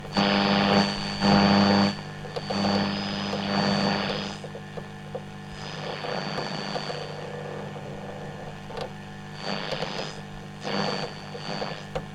Radio Fm Search Tuning Whistling Pulsating

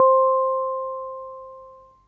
Casio 1000p Preset Piano C

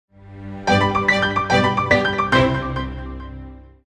Positive Piano Logo

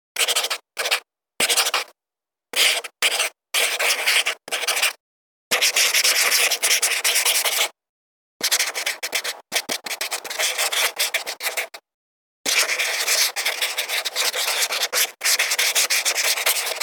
Marker Pen On Paper

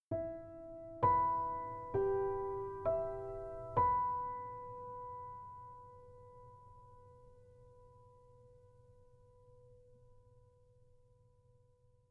Sunset Piano Jingle

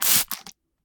Spray Bottle

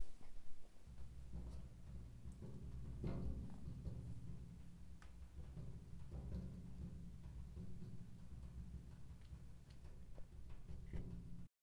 Rumbling

